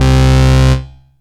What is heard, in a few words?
SYNTH BASS; SYNTH BASS 1; Megabass Remix! 2